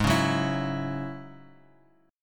G sharp 7th Suspended 4th Sharp 5th G sharp, Bass Standard; G#7sus4#5 strummed; JGuitar